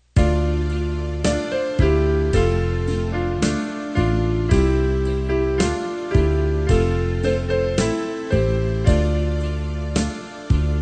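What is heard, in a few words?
soprano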